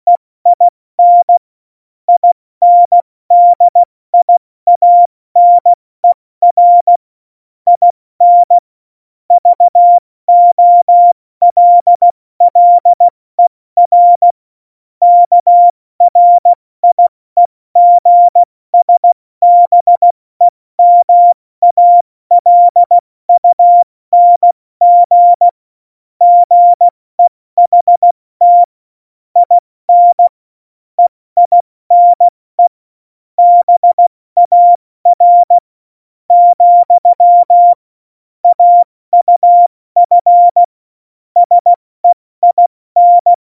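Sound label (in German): Witz, Morsekurs, cw morsen; Tempo 70 F80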